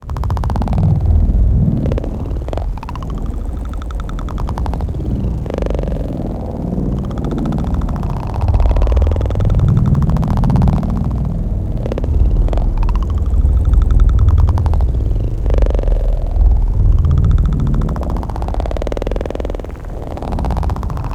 black project cinematic ambience, Mysterious Cinematic Samples; Bluezone